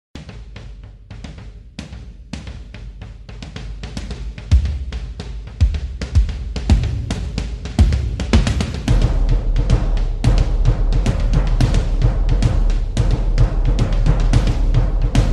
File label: drum sample